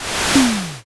fire magic

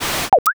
gacha roll

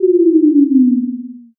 debuff

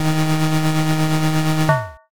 crane game

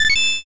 short fanfare

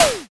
blood splat

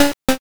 cough

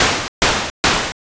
swim

